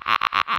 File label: laser